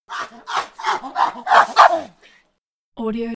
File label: chimp grunts; prosoundfx